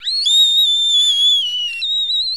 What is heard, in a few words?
BIRD, bird call; Greytsounds VOL-1; BIRD CALL FX